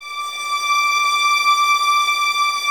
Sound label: violas D 6, String Ensembles; Miroslav Vitous; VLS 1+2